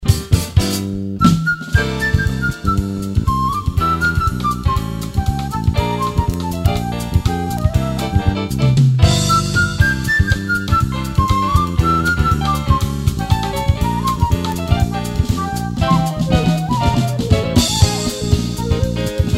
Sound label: SAMBA